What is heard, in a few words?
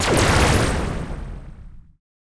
laser; spacemap; lasers